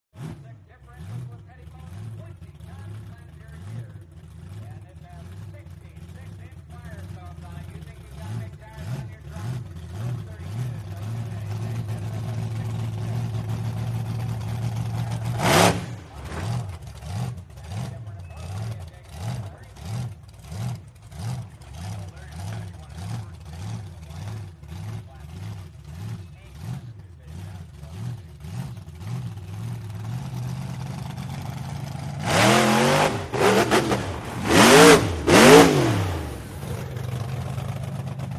Monster Trucks; Intro Ambience, Pa, Crowds, Monster Truck Starting Up, Idling, Revving, Then Drives Away